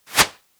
Arrow Air, Longbow; SteamVR; InteractionSystem

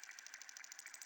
Teleport Pointer Loop; SteamVR; InteractionSystem

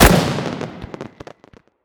Balloon Pop; SteamVR; InteractionSystem

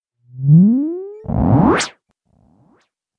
Appearance; ZED; Drone Shooter

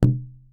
Balloon Bounce; SteamVR; InteractionSystem

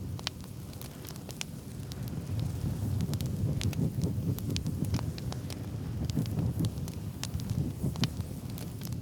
Torch Fire Loop; SteamVR; InteractionSystem

